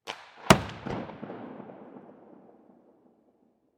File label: Hockey Slap Shot Puck Impact Boards; Sports